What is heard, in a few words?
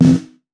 Birch Short Drag, snare; Drums; Ghosthack - Free Snares 2023